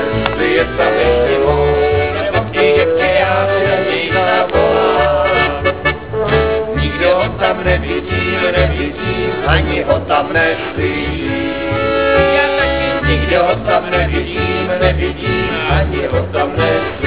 funfair orchestra; Prague

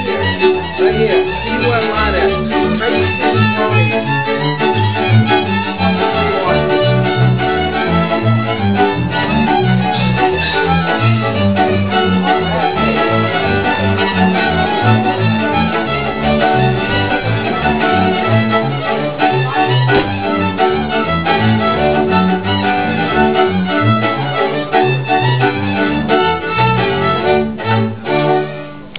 musicians, Zakopane; Poland

